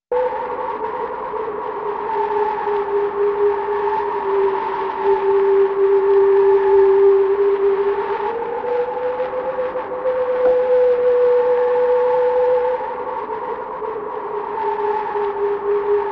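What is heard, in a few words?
wind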